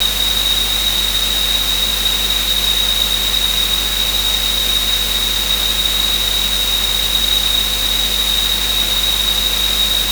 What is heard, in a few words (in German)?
Rauschfilter